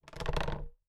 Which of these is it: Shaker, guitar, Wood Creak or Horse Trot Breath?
Wood Creak